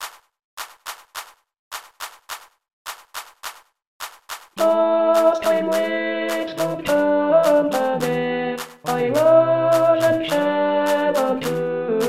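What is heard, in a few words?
Pastime Alt, alto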